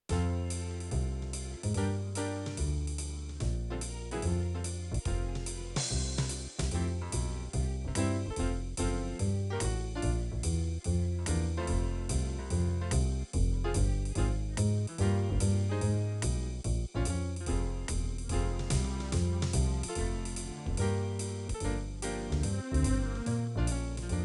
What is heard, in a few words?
Tune key of F; Bitcoin Cash